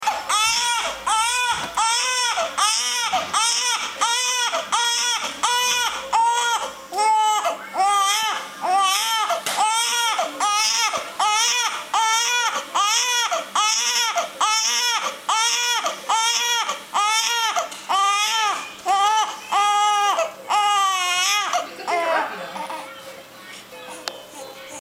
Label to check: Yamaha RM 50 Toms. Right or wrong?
Wrong